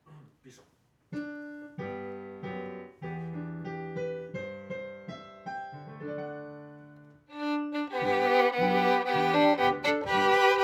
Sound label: Viola C